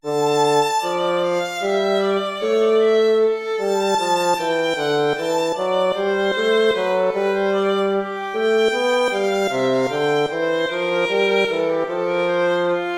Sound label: air, hornpipe